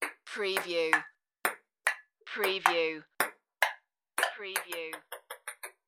Ping Pong

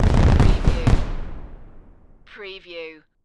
Fireworks Short Burst

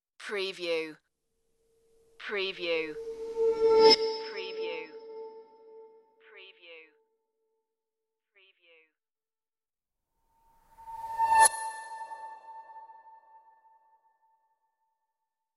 bright whoosh

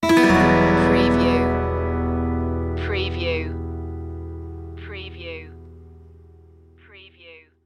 deepest regrets sting

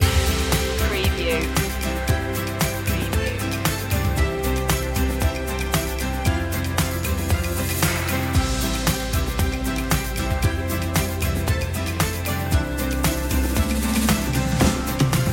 time with you loop

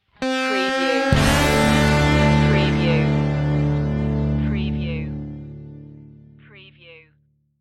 texas rocker stinger

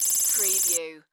Data Modulated Readout

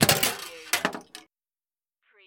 Pinball sound, ball launched, slides, and clanks

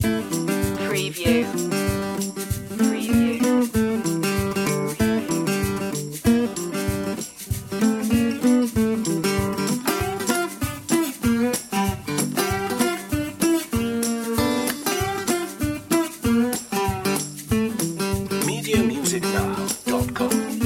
blues loop

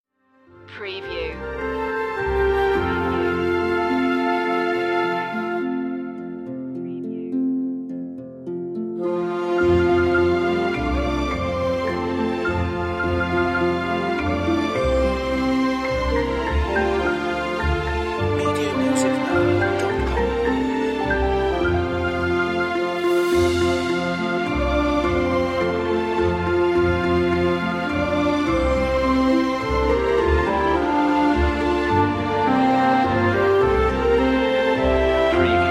orchestral; the holly and the ivy; media music now

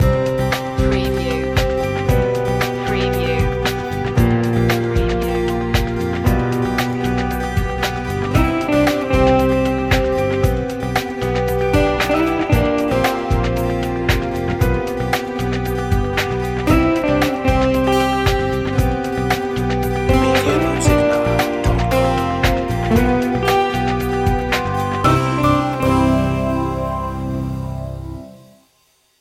corporate achievement

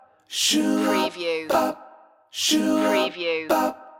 sunny day loop

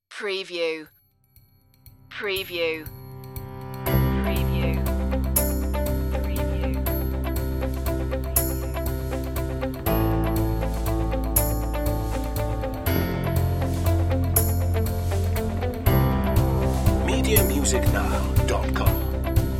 it starts with you underscore

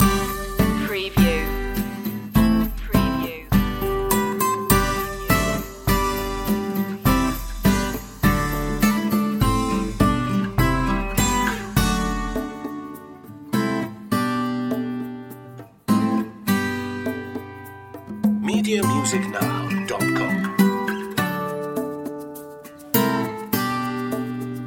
our happy place loop

